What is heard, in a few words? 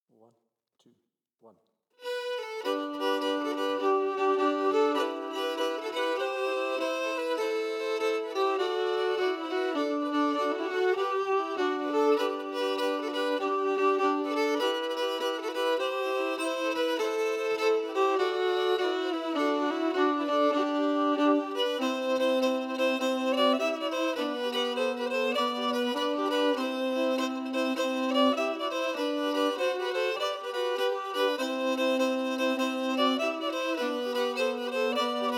harmony emphasized